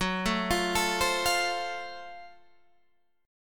F sharp Minor Major 7th Flat 5th, Guitar, Standard; arpeggiated